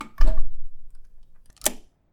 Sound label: turn key, 玄関ドア閉めて鍵/室内; turn key6